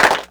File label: Clapbox Pitch; Ableton Foundation FreePacks